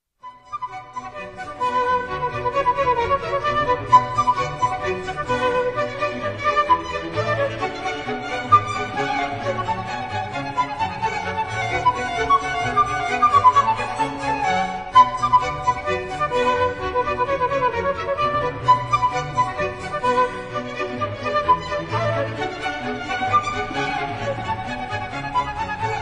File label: Orchestral Suite No.2; bach